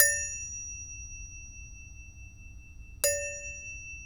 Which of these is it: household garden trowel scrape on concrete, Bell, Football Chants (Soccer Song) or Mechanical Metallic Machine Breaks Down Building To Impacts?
Bell